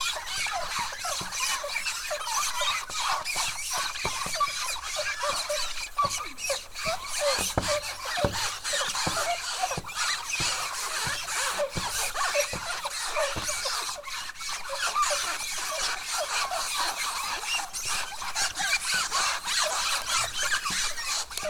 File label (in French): chaussures